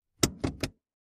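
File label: embrake release, brakes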